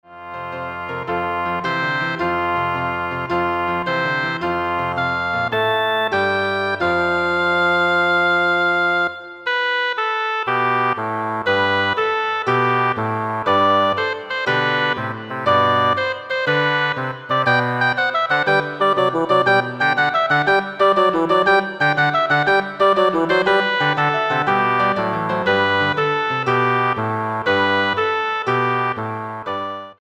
Oboe Bassoon

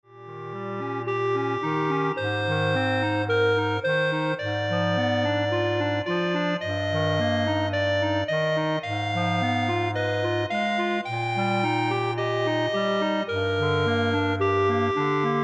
Clarinet Ensemble

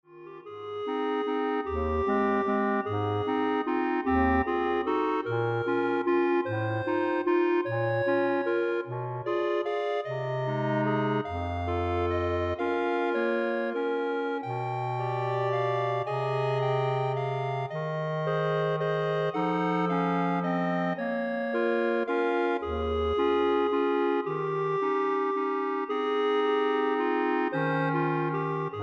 Clarinet Ensemble

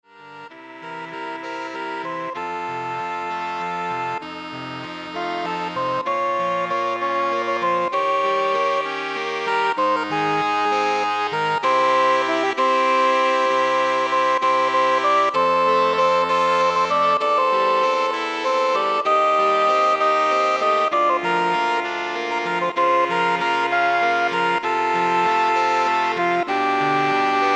Saxophone Ensemble

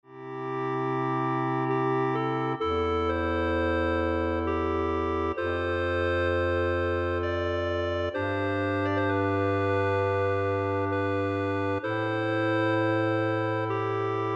Clarinet Ensemble

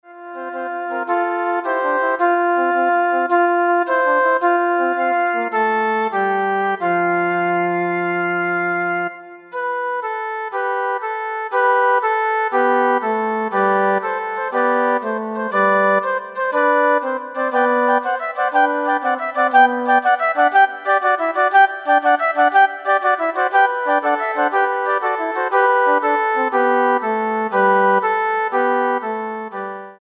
Flute Ensemble

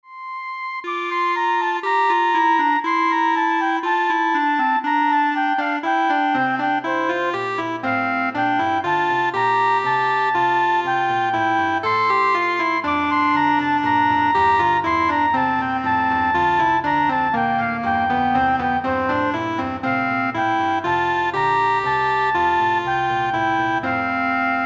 Flute Clarinet

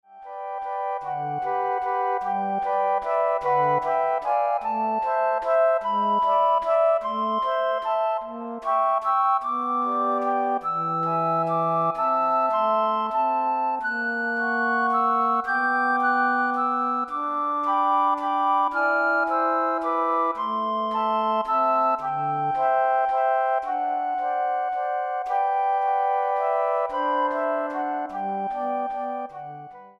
Flute Ensemble

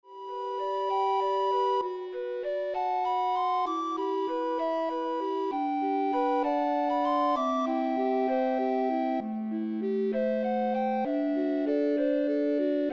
Recorder Ensemble

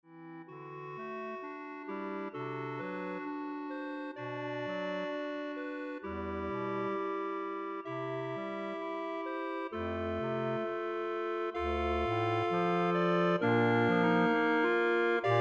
Clarinet Ensemble

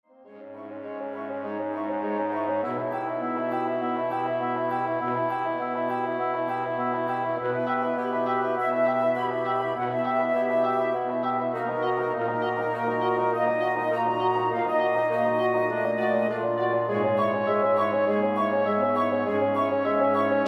Woodwind Quintet

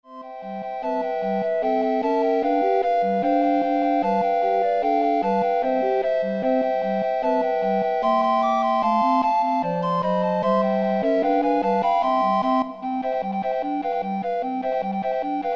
Recorder Ensemble

